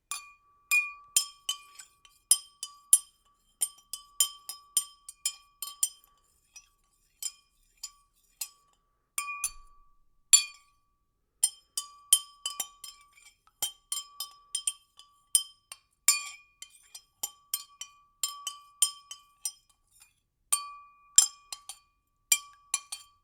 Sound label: stirring liquid in glass, foley